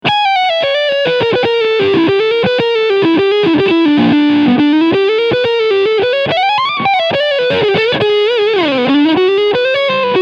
Framus Stormbender Leadsound Overdrive Front Humbucker; Framus Artist Line Devin